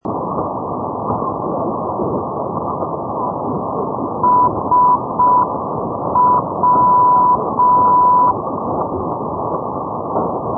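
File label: non-directional beacon; europe; shepetivka; ndb; net-moskva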